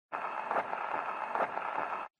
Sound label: pig; pig newton; pig squealing meme